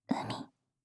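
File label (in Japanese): 海, 無声音ASMR, バイノーラル音声; シャッフル睡眠ランダム再生用; シャッフル睡眠法で眠ろう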